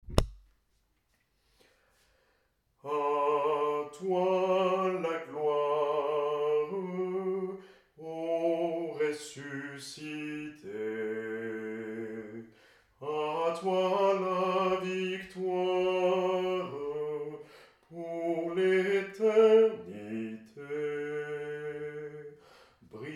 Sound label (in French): BASSE, chant a plusieurs voix; atelier de chant a plusieurs voix